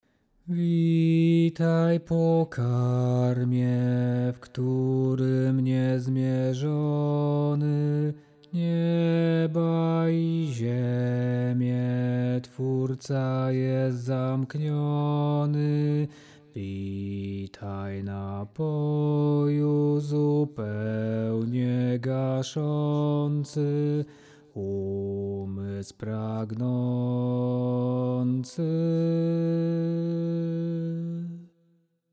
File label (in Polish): Witaj Pokarmie bas